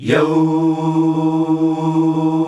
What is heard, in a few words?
YOOOOH E, male choir; Best Service; Hyper Dance